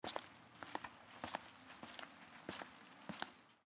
Footsteps Sneakers Cement Rain Walk; Human; Foley

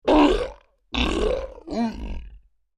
Creature Large Grunts Pain; Monsters; Sound Effects:Horror; Big Room Sound

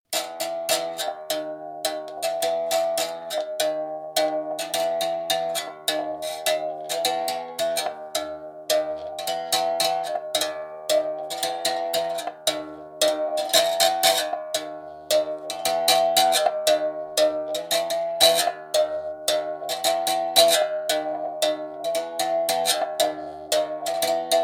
Berimbau, Playing simple music on a Berimbau.; A Berimbau is a single-string instrument from Brazil; Long Version; Concert Sound Effects

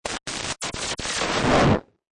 Scifi Production Element Severe Transmission

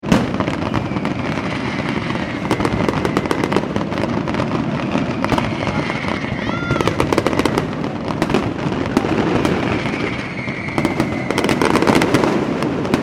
fireworks display intense; Fireworks Display - Intense Explosions At Large Commercial Fireworks Display; McKinney Sound

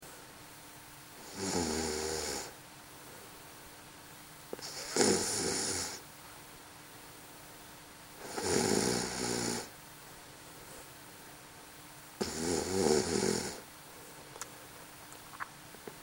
Snoring, Female Snore; human sound effects

